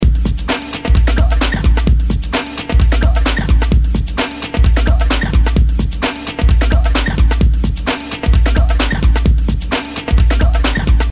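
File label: Funky, ringtones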